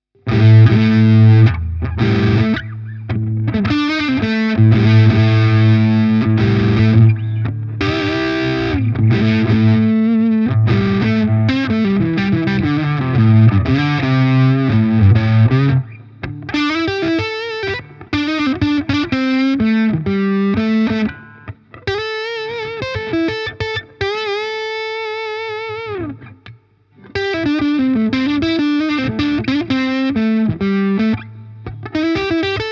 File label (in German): Harley Benton SC Custom III Hals verzerrt Coil Split